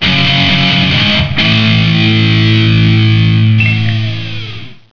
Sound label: guitar